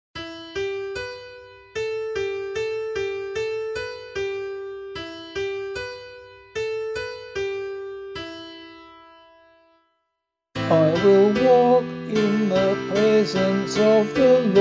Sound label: Psalm, vocal